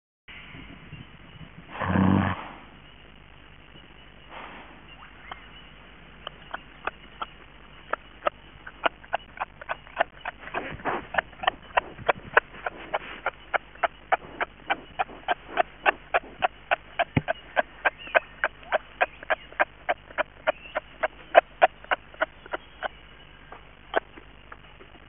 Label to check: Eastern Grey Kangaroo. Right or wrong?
Right